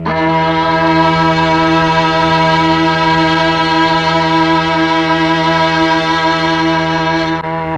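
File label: MELLOTRON strings E2; Big Fish Audio - First Call Vintage Keyboards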